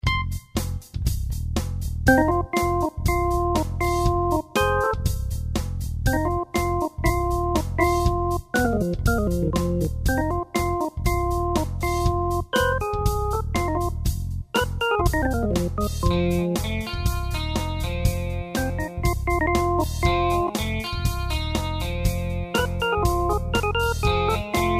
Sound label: funky, hammond